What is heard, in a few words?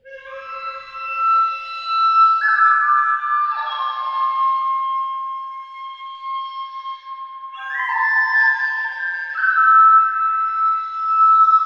Saturn Flute; Sonic Foundry (Sony Creative Software); Ma Ja Le Ethereal Textures; Flutes